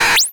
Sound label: Science Fiction Sci-Fi Electronic Monitor Shutdown